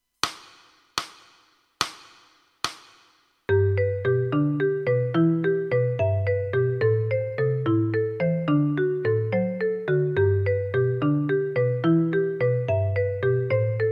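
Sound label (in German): Etüde in G-Dur, Stücke mit 4 Schlegeln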